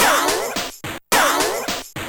beatbox; pinball dreams; Blaizer

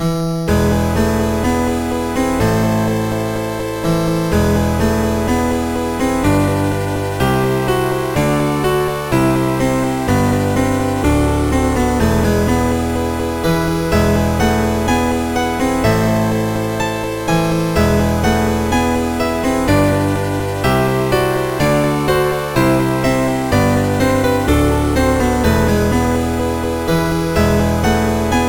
piano tune; piano tune 4; MusicLine

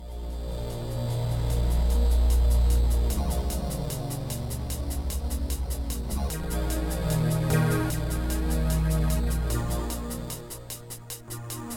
another jazz bass; Trackers; Modland; Rain

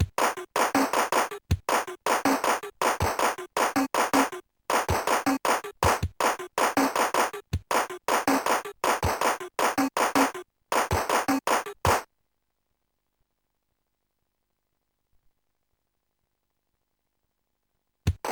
drum rhythms; Deluxe Music Construction Set

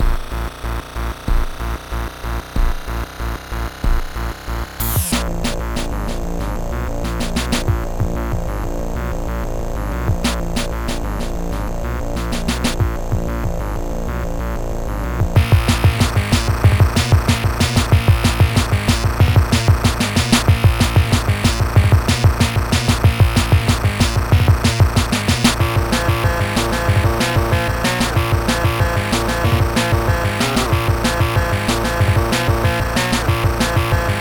intro electro, chiptune

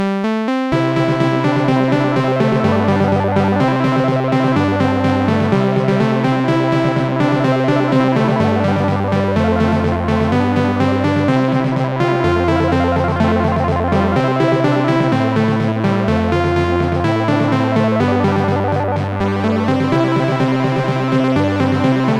victory march; MusicLine

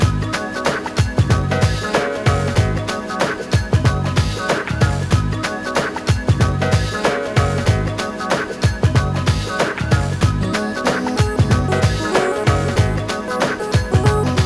funky background; Trackers; Modland

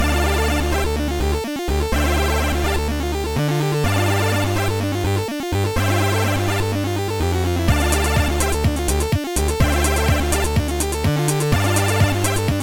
noise , chiptune

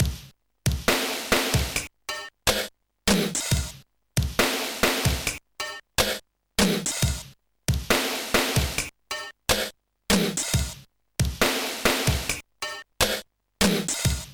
Dynamic Drums Pattern

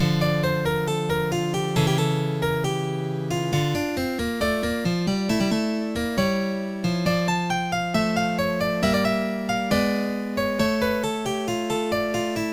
prelude; OctaMED MED

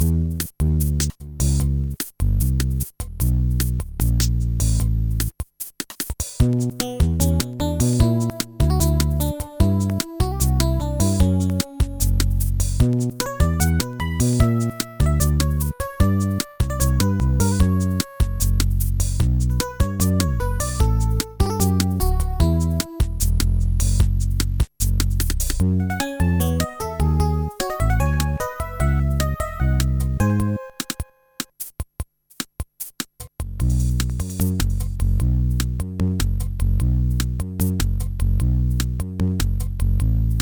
samba jam

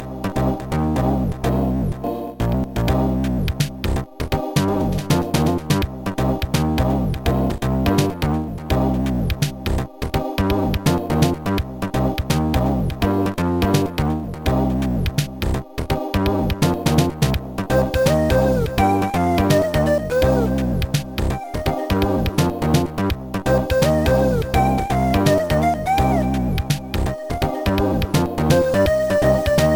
chiptune

